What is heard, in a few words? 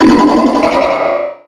pokemon cry